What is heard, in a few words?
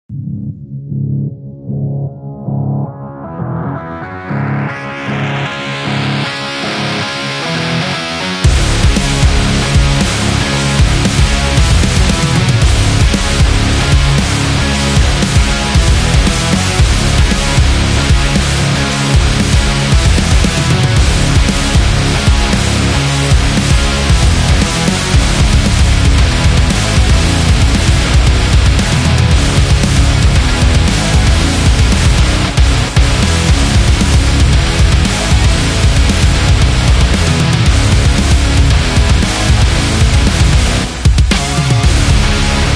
Metal